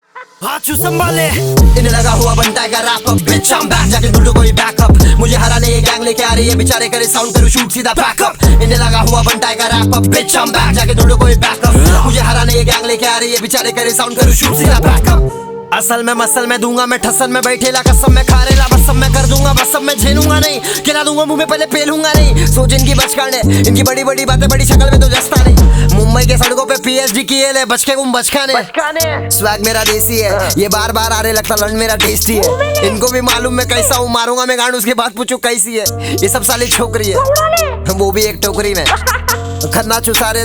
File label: rap